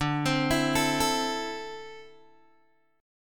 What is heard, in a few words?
D 7th Suspended 2nd down Guitar Open G